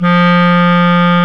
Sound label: Clarinets; Roland LCDP04; 90 s Sample CDs; Clar Cls